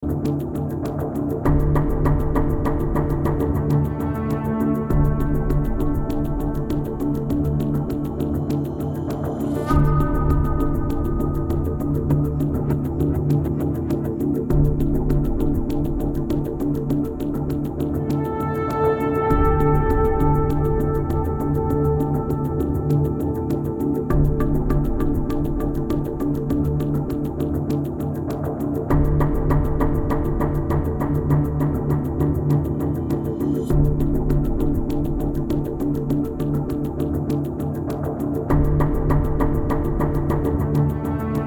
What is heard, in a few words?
music reveal and exposition; freelancer-hd-edition